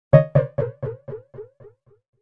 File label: Funny button sound